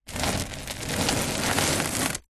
container tub plastic heavy short slide gritty concrete